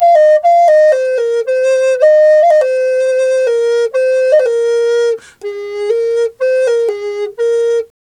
F 120, FLU RIFFS, Didgeridoo and Other Primitive Instruments; Big Fish Audio - Didgeridoo and Other Primitive Instruments